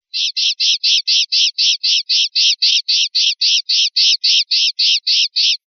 rep rabieta achillonada, pardillo

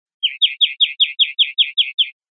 golpes corridos yio, verderon

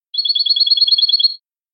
cascabel

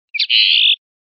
rep ranadas, pardillo

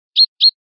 pinzonada; verderon

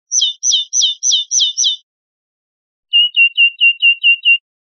rep primaveras, pardillo; linked files test pardillo

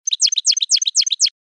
bicheada lenta; campero

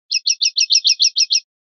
rep chachases, pardillo

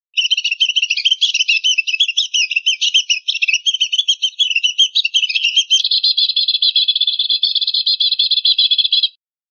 rep cernicaleos, pardillo

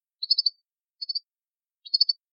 pirreos, campero

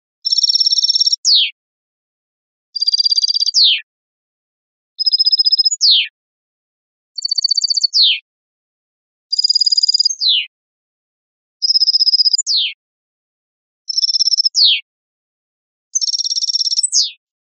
terminacion timbrada

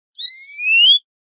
pui; verderon

